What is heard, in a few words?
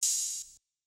OH, Open Hats